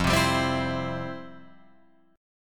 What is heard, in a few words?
F Major 7th Suspended 2nd, F, down, Guitar, Open F; strummed; JGuitar